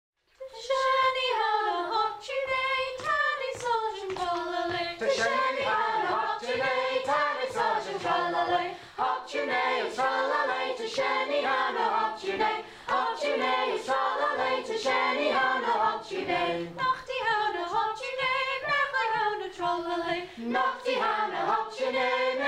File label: Hop tu naa sung; MANX FOLK AWARDS